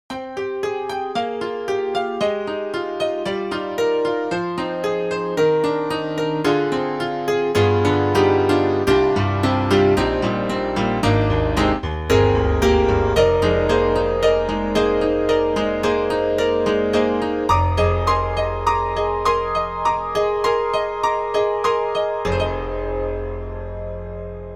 80s Piano Layer

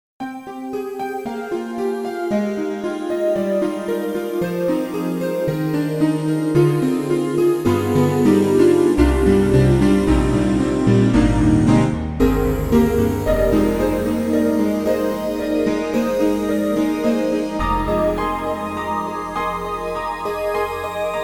KORG KRONOS 2 Sparkling Piano

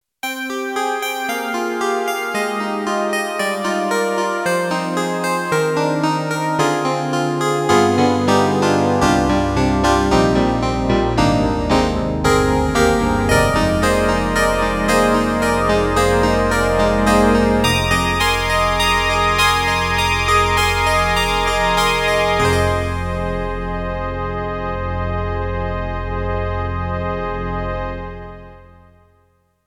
E-MU Proteus1, Piano Bell; PopRock

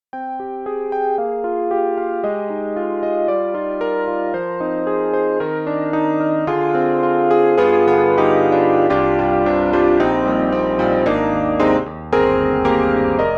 Yamaha CP80 Flanger Light Flanger; Steinberg; The Grand 3

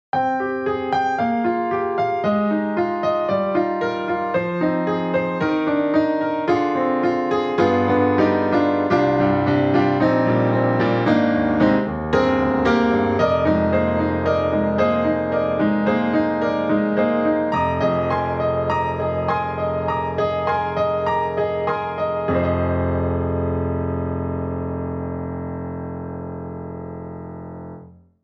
Studio Grand, Mr Bright; XLN Audio; Addictive Keys

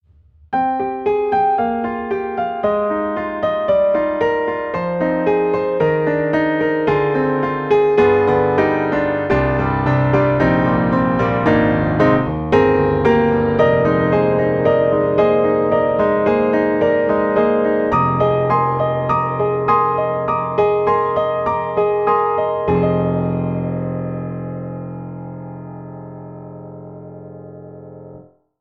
Pop; MODARTT S.A.S.; Pianoteq; D4

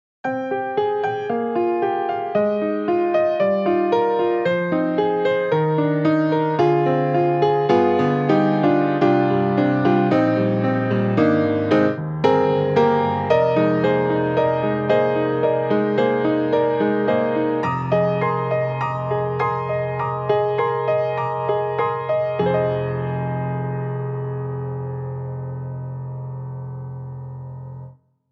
Keys Modern Upright Come On Up; XLN Audio; Addictive Keys

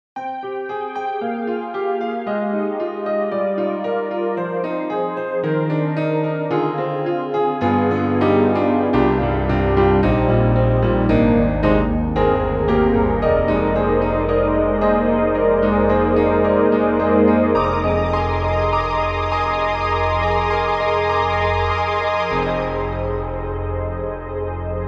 Warm Piano and Pad; Hybrid

